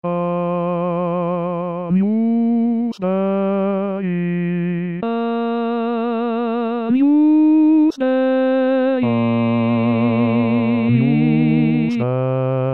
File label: Missa Agnus Dei Hommes; La Messe O magnum Mysteriumde Tomas Luis de Victoria